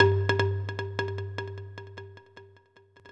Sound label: arimba, Synths; Notes